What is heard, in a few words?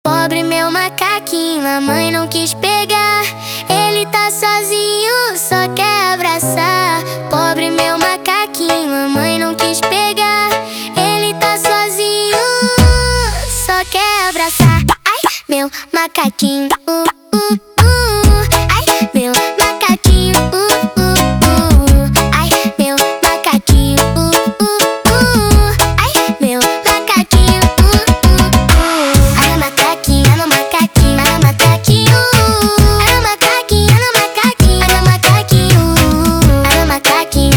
Montagem, phonk music